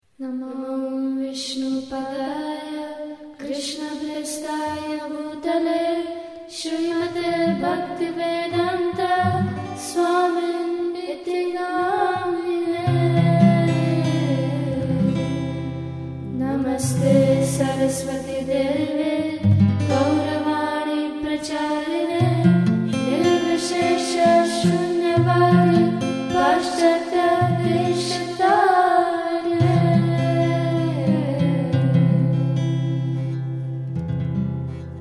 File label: Pranam Mantra Group Song; Gopals Studio; Gopinath's Girls